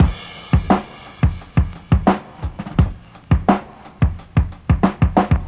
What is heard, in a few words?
drums away, drum loops